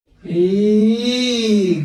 yeeeeeeeeeeeeee

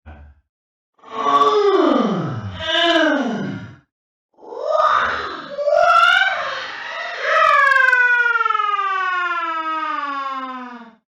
weird moan

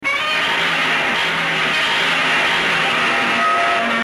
godzilla roars

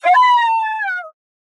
coyote call; soundbible

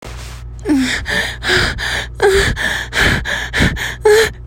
moan

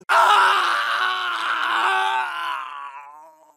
scream; mk9; shang tsung

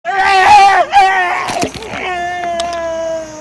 gorgel

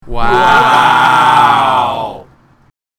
wooooooow

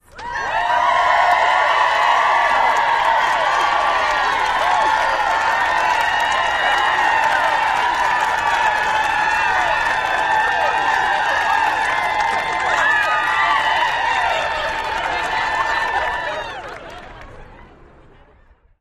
crowd cheering wildly at outdoor event

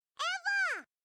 eevee cry; swsh

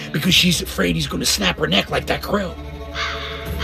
crow

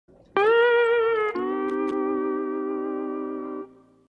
disappointed sound steel sting; spongebob

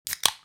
can opening

